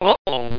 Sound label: B 17 Bomber Uh Oh